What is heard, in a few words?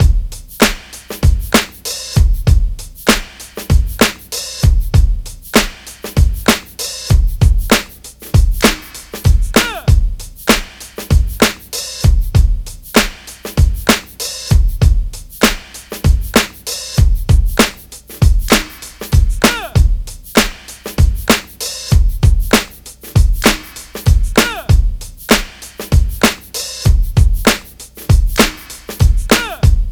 97.2 Heavyhard Clappy Huh, Breaks; Lockdown Breaks